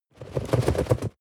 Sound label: cardboard box medium shake short; objekte